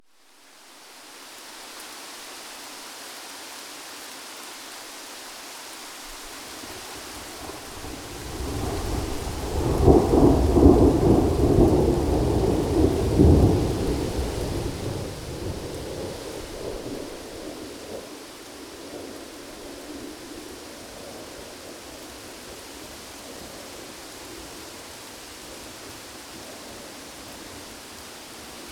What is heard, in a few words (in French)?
orage, water; ambiances